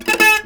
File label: JAKAY; Spectrasonics; Heart of Asia